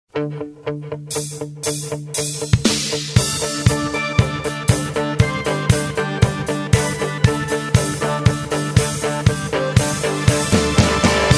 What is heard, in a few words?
8th note pop rock